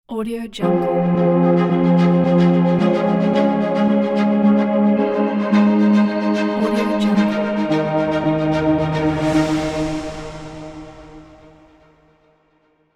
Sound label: cinematic inspiring ident